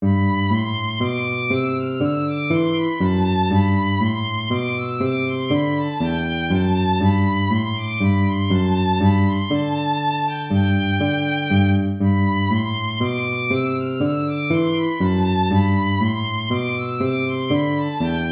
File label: baroque minuet major